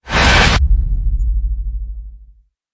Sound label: Horror Hit Swell